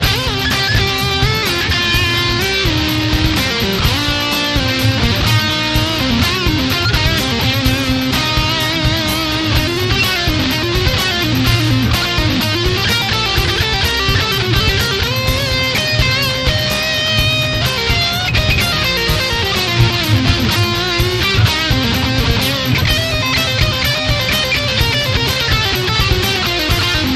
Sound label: solo